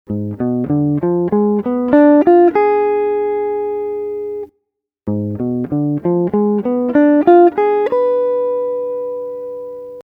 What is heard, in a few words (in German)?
Dim. Arpeggio 2 Varianten